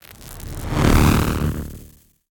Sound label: transition pan; BlueZone; Cinematic Dark Sound Effects